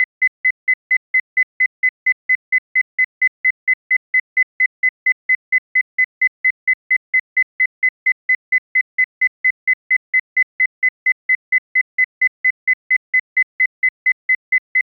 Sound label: electronic beeps; interface